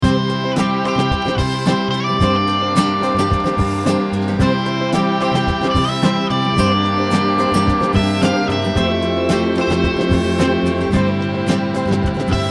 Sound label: radio jingle